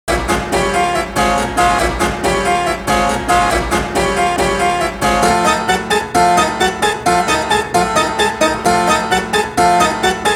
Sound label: Harpsichord Solo; Poulenc Remix